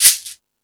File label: Percussion